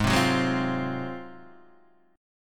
Ab 13th Ab Guitar Half Step Down

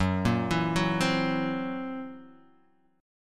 Gb 7th Flat 5th Gb down Guitar Half Step Down

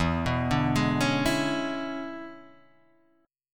E 13th, Guitar, Half Step Down

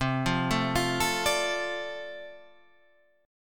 D-Minor 7th C, chord

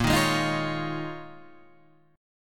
Eb 9th Suspended 4th

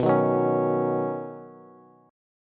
B Major Add 9th B Bass; Badd9; strummed; JGuitar

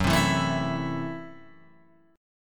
F sharp Minor 7th Flat 5th F sharp Guitar Open G

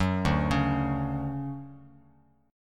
D-Major 7th D down Bass Standard; arpeggiated; JGuitar

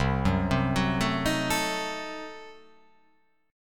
C Diminished 7th C

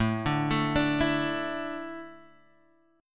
A Suspended 4th, Asus4 chord; arpeggiated; x,0,0,2,3,0; JGuitar

